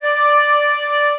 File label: flute